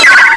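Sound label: torpedoes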